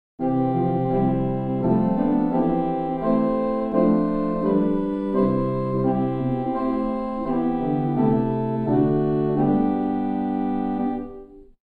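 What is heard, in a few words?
Great Principal 8; First Baptist Riverside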